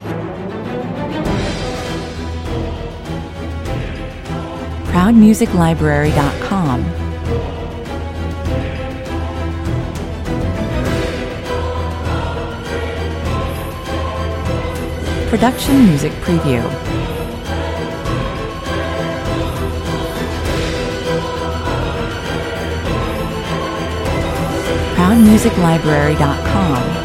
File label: (with choir no solo vocal)